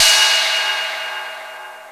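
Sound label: cymbal, electronic drums; Crypton - DrumBase5000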